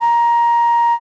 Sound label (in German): basic short, Schallsignale